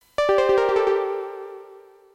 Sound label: Sky Blip